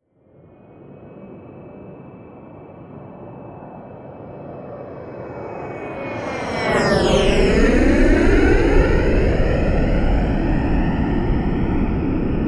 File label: plane bombardier private flyby close